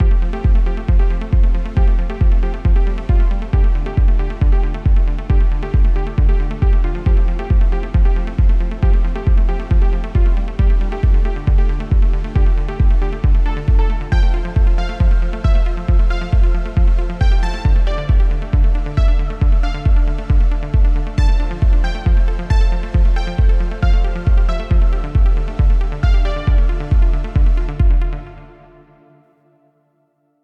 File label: Melodic Techno Textures; Toontrack Melodic Techno Textures EZkeys MIDI